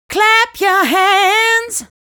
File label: Vocal Phrases; Ultimate Vocals; Clap Your Hands